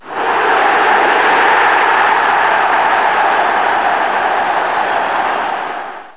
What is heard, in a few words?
big ooh